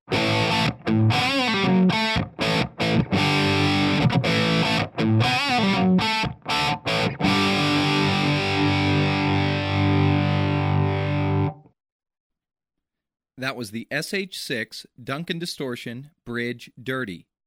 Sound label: dirty